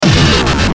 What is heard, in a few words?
Hit Hard Buzz, Hit Hard Buzz Distortion; Sound Effects:Sound Design; Blastwave